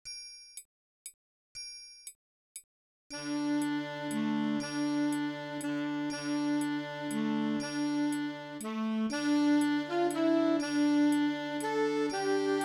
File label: Saxophone